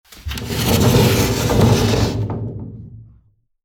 襖扉を開ける音; door

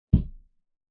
外側からを壁を叩く音

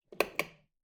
和風 電気を点ける音; light

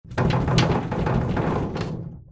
和室の扉がガタガタ鳴る音; door

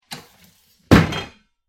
シンクの下の引き出しを閉める音

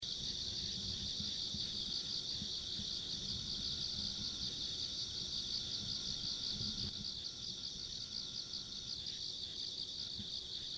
田舎道の虫の環境音

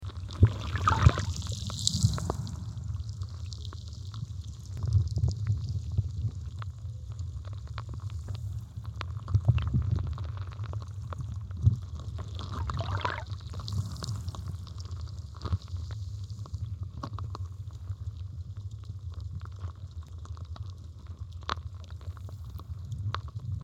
海中の音

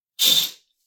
カーテンを開ける音; curtain

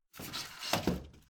襖扉を閉める音; door

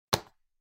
リモコンを置く音

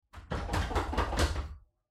扉ガチャガチャ

